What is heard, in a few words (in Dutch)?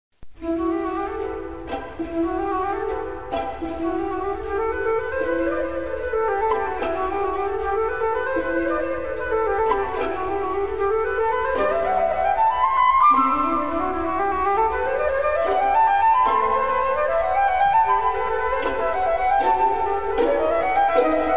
vlindermuziek